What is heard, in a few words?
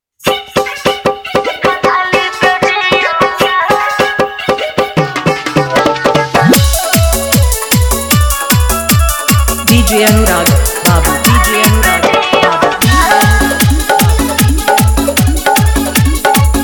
Full Dhollki Bass Rod Dance Mix